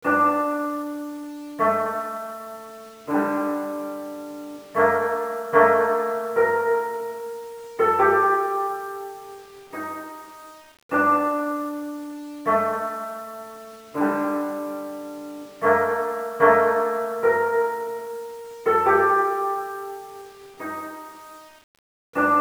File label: bgm koto; yakuza ryu ga gotoku kiwami 2 pc gamerip